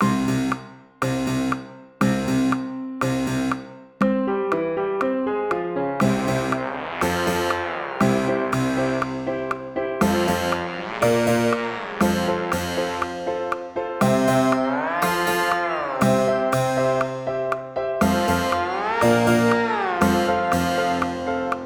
range tenor; tenor trombone; Range Stretch; Tempo = 120; First Habits Warm-Up

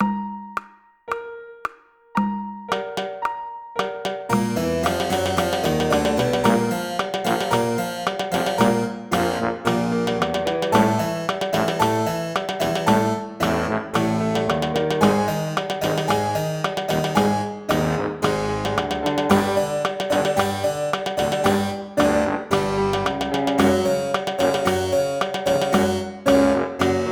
speed 112 132, trombone; bass trombone; Tempi = 112, 132; First Habits Warm-Up

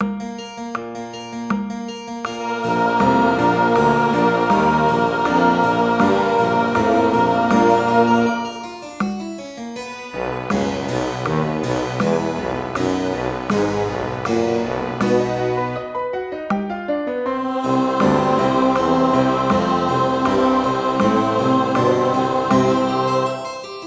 intervals bass 80; bass trombone; Note Placement: Intervals; Tempo = 80; First Habits Warm-Up

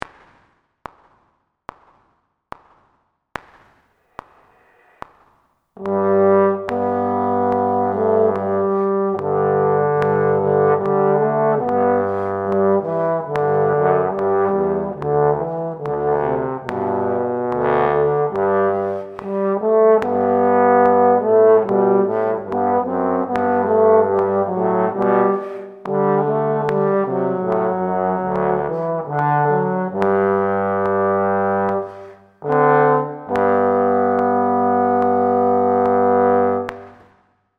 Maj G, trombone; G major; The Tone Ladder

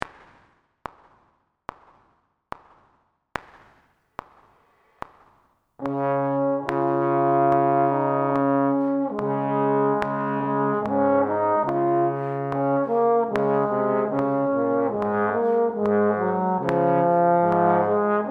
Maj d flat, trombone; The Tone Ladder

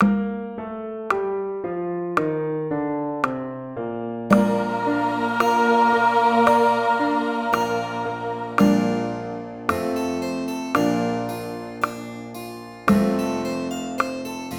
buzz bass; bass trombone; Air/Buzz; Tempo = 56; First Habits Warm-Up

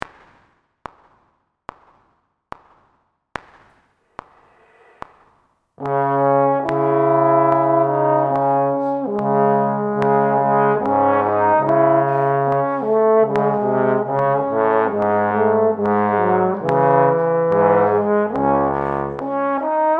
Min c sharp, trombone; The Tone Ladder

